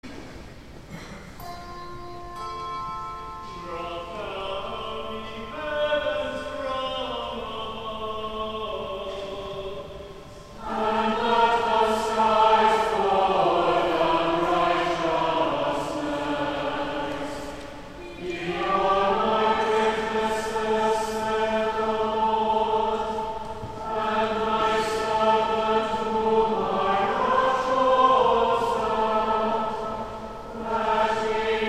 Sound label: Antiphon and Prose, plainsong; St. John's Cathedral, Jacksonville Florida; 'Ye are my witnesses, saith the Lord'